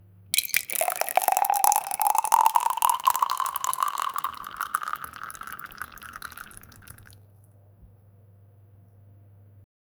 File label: milk pouring instantly